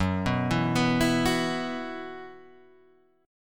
Gb 7th Suspended 4th Gb down Guitar Half Step Down, chord